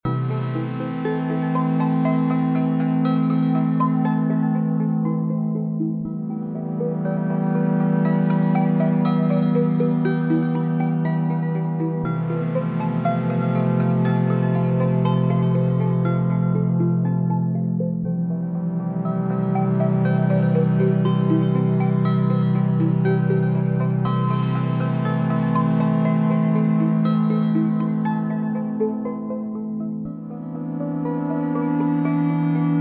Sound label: Synth Band; Downtempo; Rapid